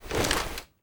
foley gear rattle

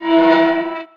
Amb Constructions

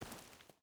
footstep barefoot stones walk; Rust

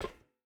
footstep hide metal walk; Rust

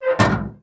Vehicle Hatches; Red Orchestra Ostfront 41-45

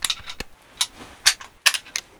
Inf Weapons Foley; Red Orchestra Ostfront 41-45

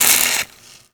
elec spark welding ext; Modern Warfare

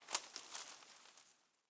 leaf rustle twiggy med

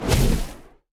depthbomb; World Of Warships; weapons

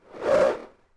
Arrow Fly; Civ4 warlords

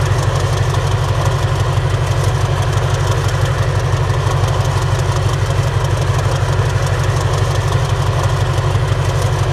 Warrior engine idle; DCS

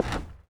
plank slip

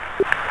chat squad; World of Tanks Blitz; GUI chat

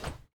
Aircrafts MiG-15bis Canopy Close End; DCS

